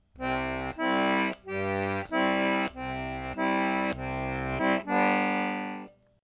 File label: And phrase four; Back Door